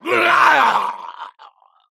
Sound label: Ghoul Death